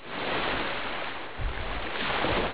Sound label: OCEAN